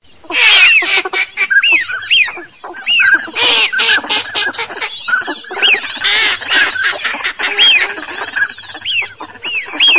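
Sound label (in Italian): FISCHIO CODONE MESTOLONE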